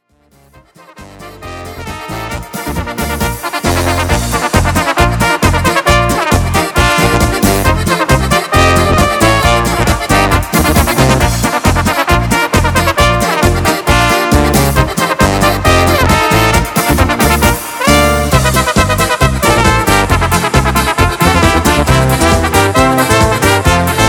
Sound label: Polka